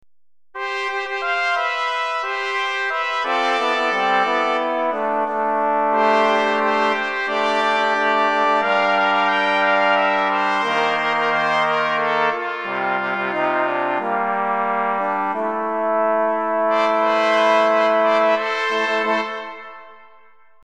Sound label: Fanfare; Glimmerglass